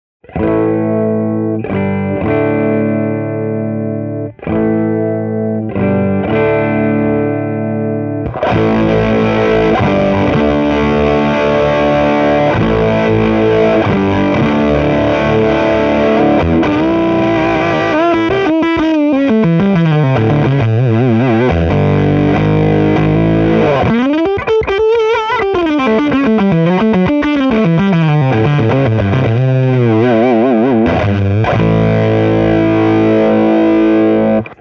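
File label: afro position 1, pedals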